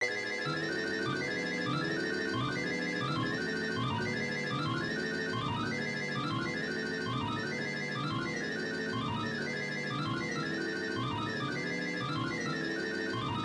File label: ensemble